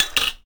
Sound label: Hacksaw Metal Drop; SFX Kit; Foley - Misc